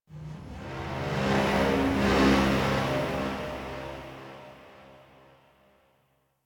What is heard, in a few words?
Ship Metal Moan Texture Large, Ambience; Warframe; Corpus Ship Remaster